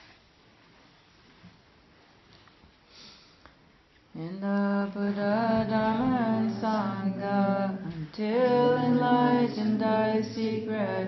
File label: Chant